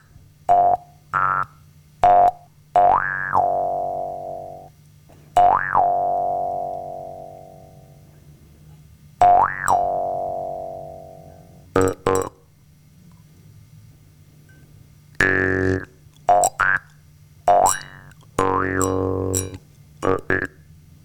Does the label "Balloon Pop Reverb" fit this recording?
No